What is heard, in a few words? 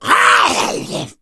hunter pounce